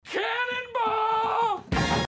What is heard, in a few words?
Cannon Ball